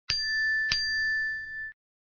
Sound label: warnings; gx tieta